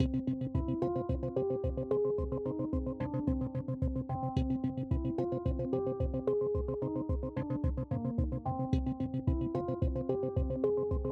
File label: balloon bg music